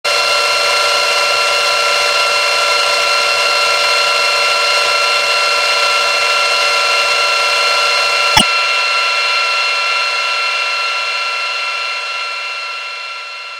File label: Ascending Synth Sounds; Blastwave FX